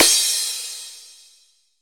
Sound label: crash loud